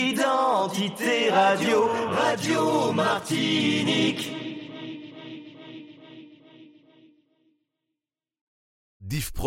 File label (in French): Drop Chante Tropical; Identite Martinique